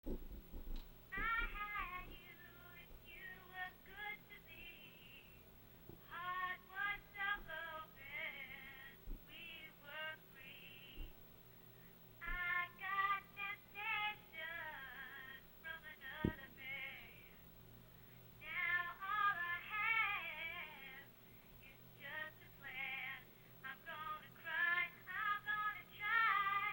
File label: blues